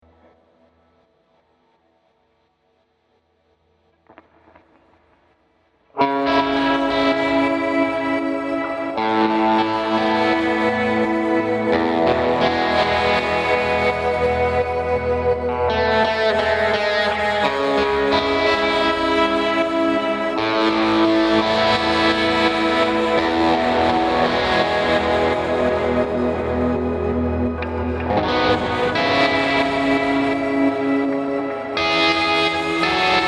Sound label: Delay trem